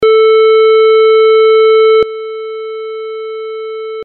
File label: loud soft